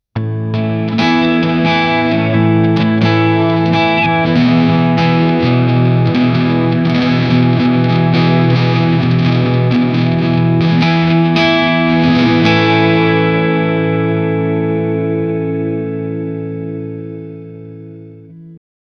guitar style clean electr

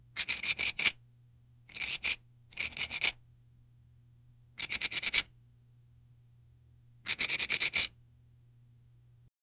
effect of an eraser, stationary

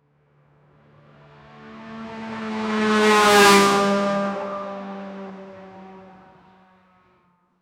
Porsche 911 RSR fly by; 2018

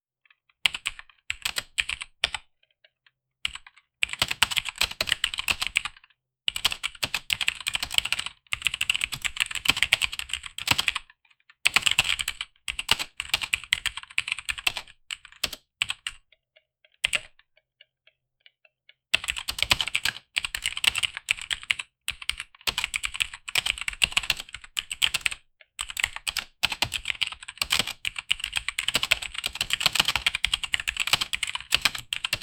realistic mechanical keyb, typing

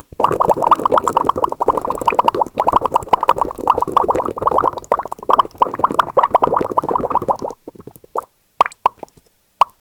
blblblbl muffled pudding

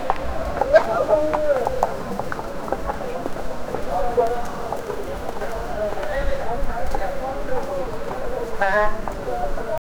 a busy middle eastern mar

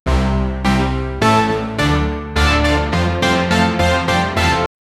heroic music build up

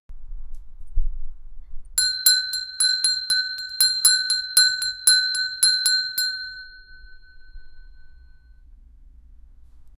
bell toll

